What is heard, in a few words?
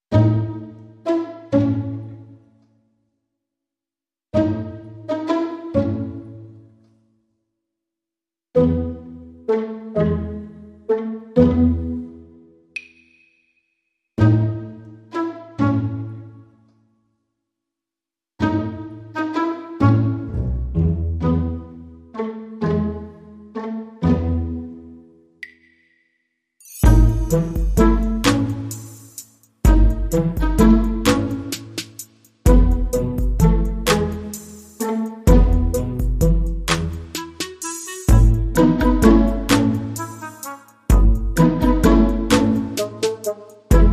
Modern Percussion Full